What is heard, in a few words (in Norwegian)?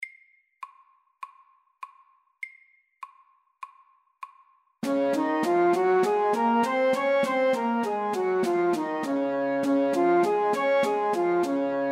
Dess dur natura FORT